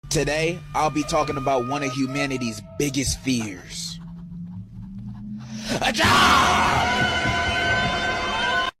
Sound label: very scary ahhhh